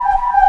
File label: Whirly; Mo' Phatt; Digital Sound Factory Mo' Phatt